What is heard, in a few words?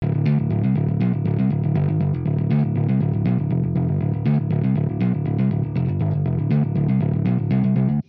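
amp wet bass, Hybrid neural guitar overdrive; Morphdrive; Demos for hybrid configurations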